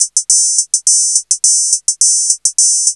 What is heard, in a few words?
System100, synths; System100M